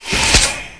Close

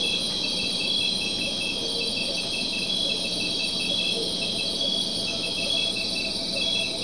Insect

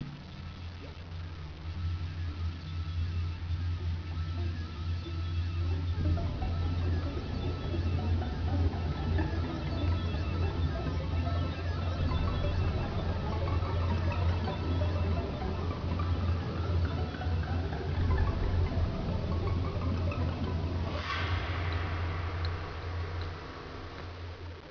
loop; BlairWitch; WorldsPlayer

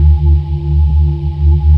Station

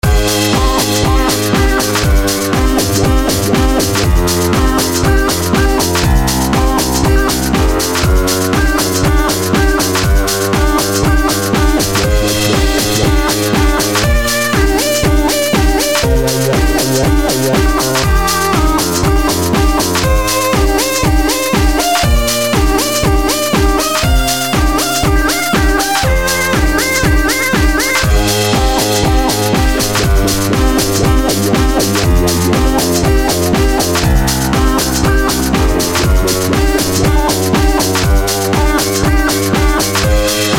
backsound

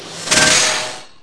Dis door

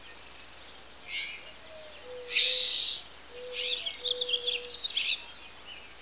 Birds

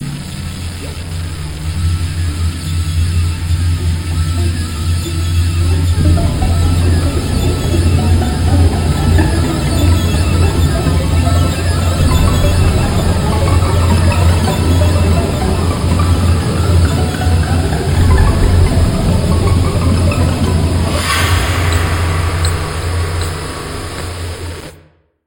Blair Witch Woods; WorldsRemasterProject

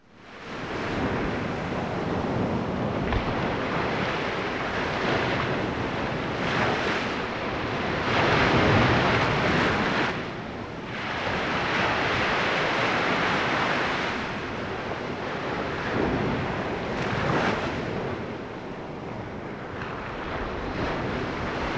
OCEAN

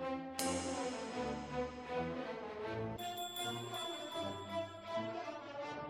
ambient loop

